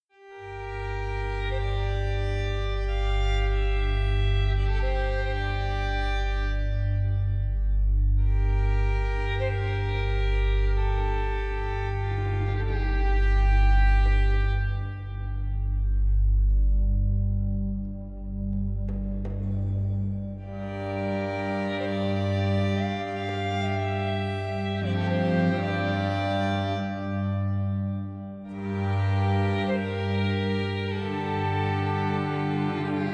Portuguese; Age of Empires II Definitive Edition; Civilization Themes

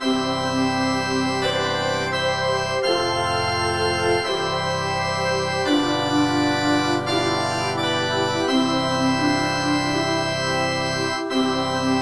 Ambient Church Happy; Old School RuneScape

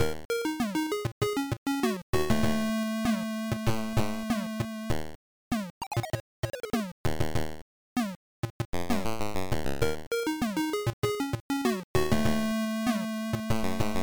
Cave; Star Wars; Master System

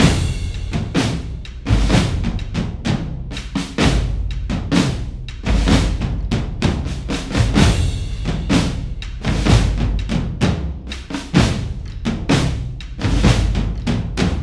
Drums; For Honor

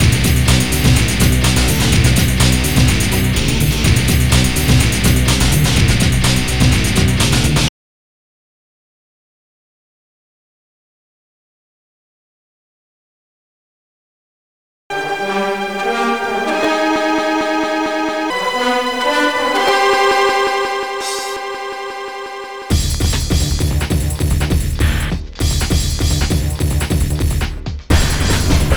Background Music; 7th Legion; Windows gamerip 1997

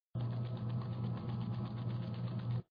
Conveyor Belt; papas pastaria to go; android

